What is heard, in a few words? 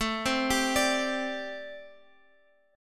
A Minor 11th A, Chord